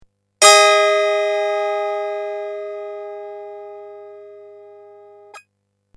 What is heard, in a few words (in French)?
Loups Meso